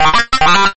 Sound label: Robot talking; gridtracker